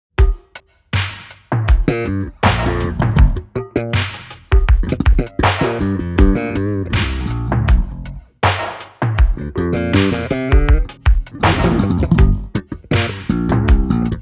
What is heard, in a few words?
Interlude Victory